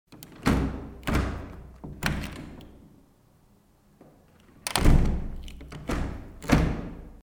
zvuk otkrytyh okon

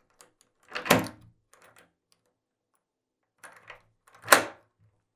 zvuk otkryvanija dveri

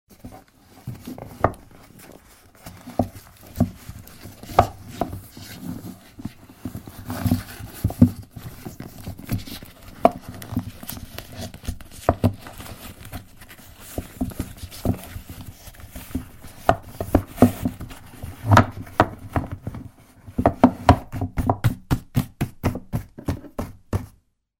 zvuki biblioteki

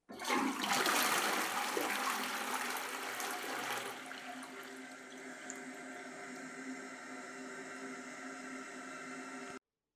zvuk unitaza

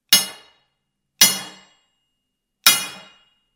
zvuk udara po metallu

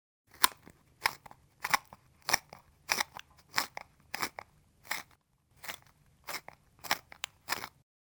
zvuk tochilki karandasha

